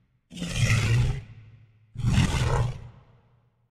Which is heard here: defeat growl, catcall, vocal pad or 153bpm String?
defeat growl